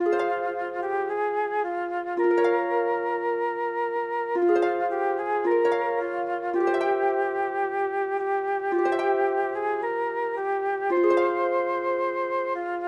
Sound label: for flute harp; anonymous will you go blackbird